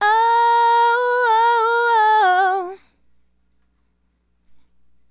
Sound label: Female Solo, Hip-Hop and RnB Vocals